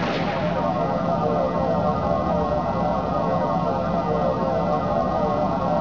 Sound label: tractor; sunrisechat